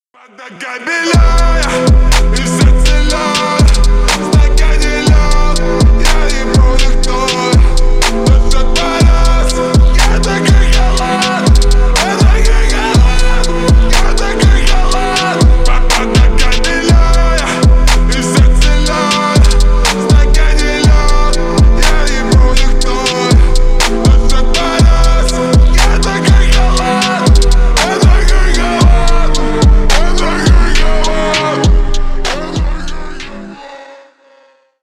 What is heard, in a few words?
slowed reverb